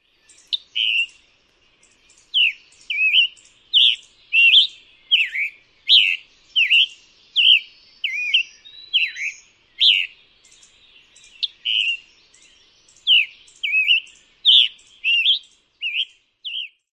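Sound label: SCATAN dawn song, scarlet tanager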